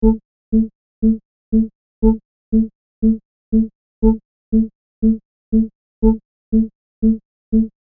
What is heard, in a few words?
Pulsating Alarm Sound Loop